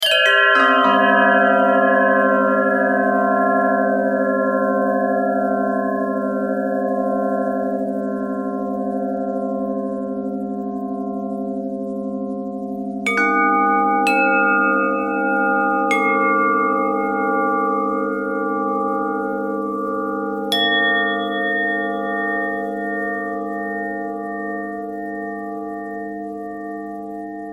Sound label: bell bend twist, bells